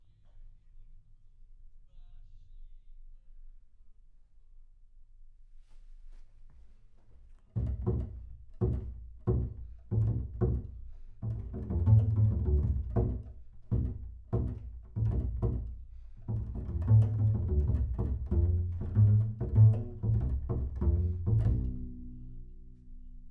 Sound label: Bass Room